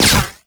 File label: Flak Cannon Alt Fire Pass By; Warframe; UT Flak Cannon